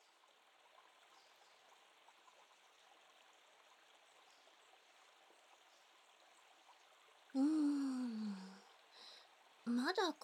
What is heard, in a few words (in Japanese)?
耳のマッサージと泡洗浄; ビャクといっしょに